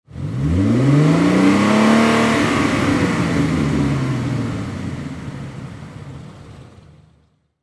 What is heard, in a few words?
Auto Ford Gt 500 Kr Mustang Engine Rev Automobile Car; Transportation; Cars